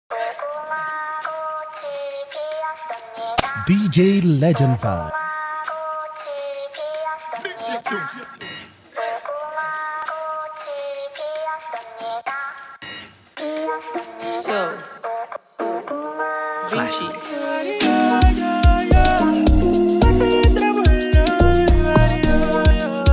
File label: Amapiano Remix